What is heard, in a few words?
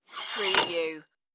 cloth hanger slide